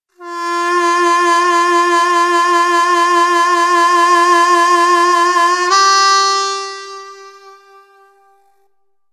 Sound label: Effects, harmonica